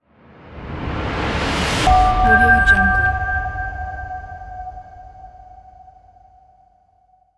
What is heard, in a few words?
digital open logo